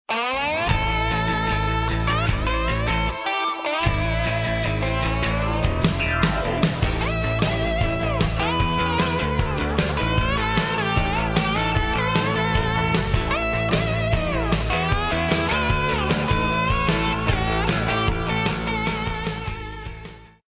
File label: country